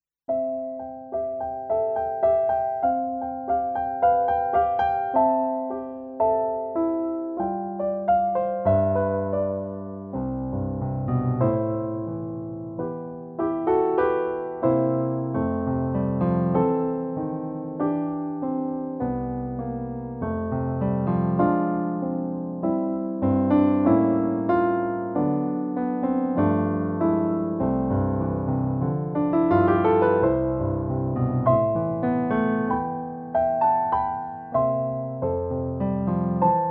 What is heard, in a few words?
instrumental